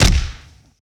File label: fighting punch; soundscrate